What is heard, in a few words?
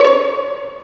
STR VLN PI, String Sections, Violins Pizz; Roland LCDP13